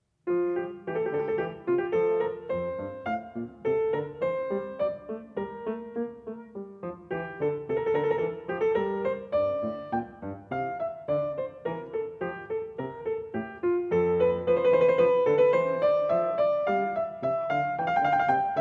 Menuett; English Suite No. 4 in F major; BWV 809; BACH; ENGLISH SUITES

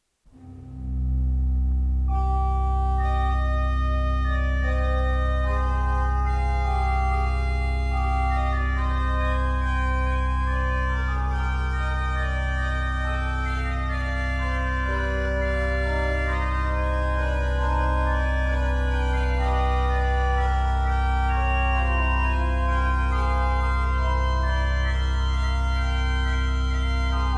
Fantasia and Fugue in C minor, Fantasia, ORGAN; BWV 537; Bach; Organ Works

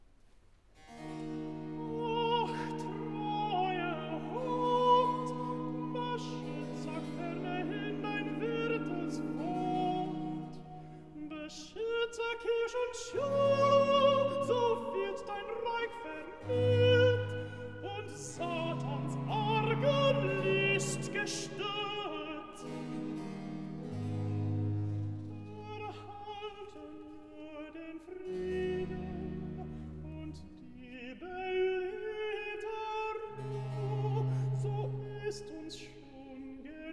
Recitative Alto; vocal; BWV016; Ach treuer Hort; BACH

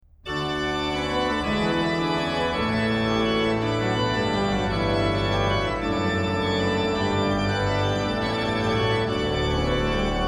Vom Himmel hoch, da komm ich her, organ; BACH; BACH ORGAN WORKS; CHORALE PRELUDES

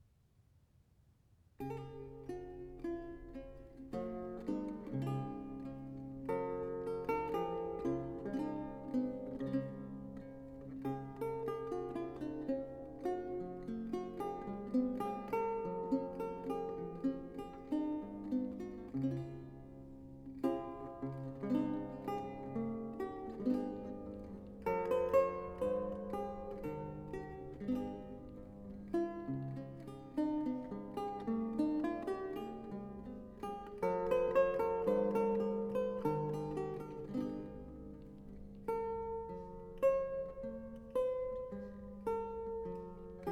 Lute Solo; GREENSLEEVES; Robinson's May

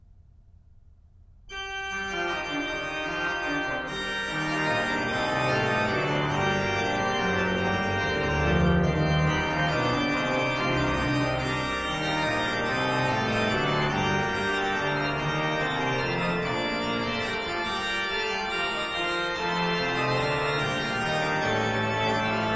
Wir Christenleut, BACH ORGAN, Chorale preludes; Orgelbüchlein

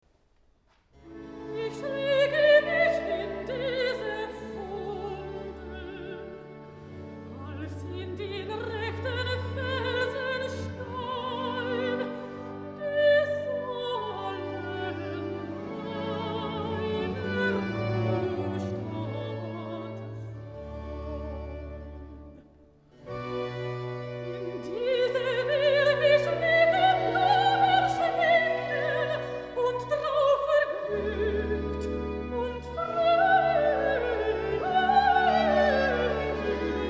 BACH for ALTO; vocal; BWV199

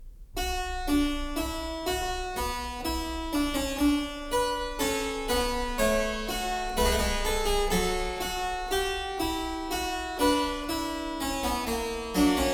Fugue; BACH; WELL-TEMPERED CLAVIER; WTC 2

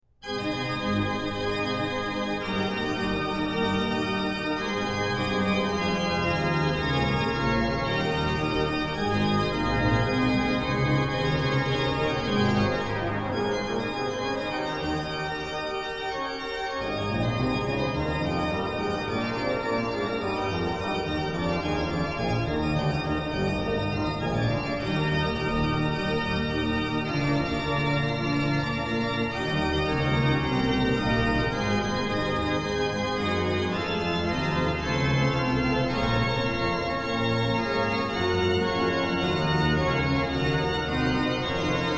Concerto in G Allegro, organ; ORGAN CONCERTI; BWV0592

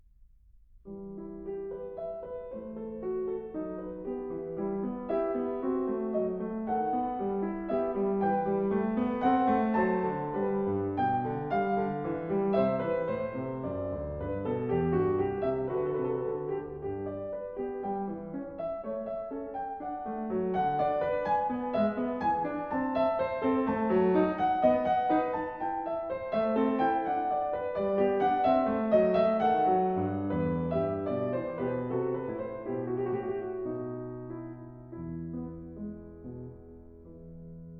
Prelude In E Minor; BACH; COMPLETE SOLO CLAVIER WORKS; TOCCATAS PRELUDES FANTASIAS

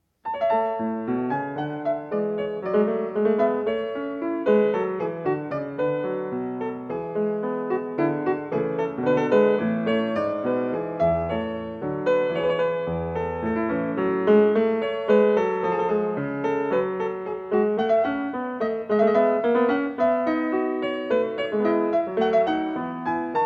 Courante; English Suite No. 1 in A major; BWV 806; BACH; ENGLISH SUITES

